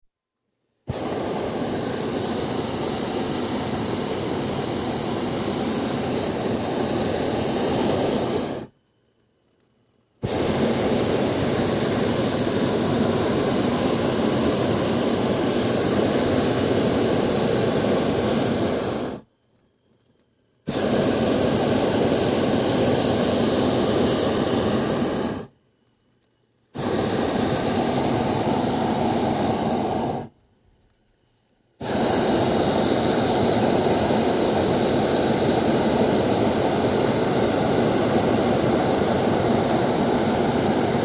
Hot Air Ballooning, take off & constant run with burners, pilot light & some clinks of equipment (Recorded from basket); Miscellaneous Sport; BBC